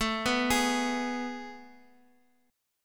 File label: A Major 7th Suspended 2nd, Guitar, Open F; AM7sus2 arpeggiated; x,x,x,4,2,3; JGuitar